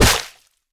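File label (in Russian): короткий глухой выстрел из водного пистолета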